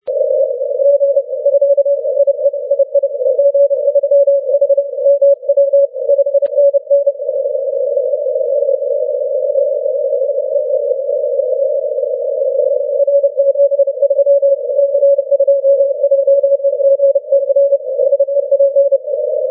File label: 30m cw